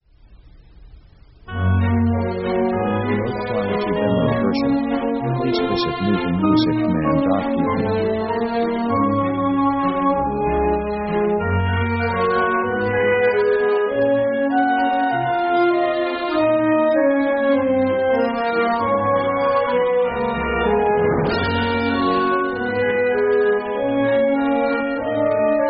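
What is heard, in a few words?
easy walking orchestral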